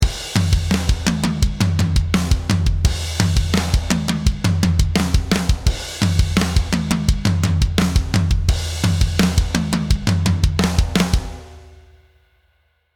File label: Hard Rock Tom Groove 2 - Processed Dry, drums